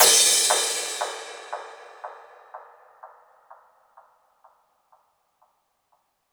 Crash

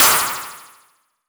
hitech clap robotic cyber

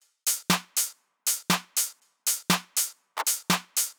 Drum Loops 120

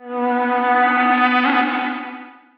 synth wavy saw B

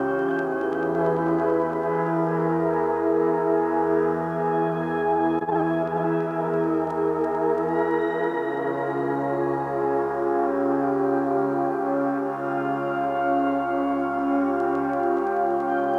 120 lofi pad loop cinematique Em

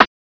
low dark rim

